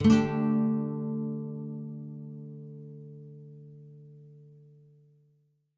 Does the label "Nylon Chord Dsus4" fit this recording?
Yes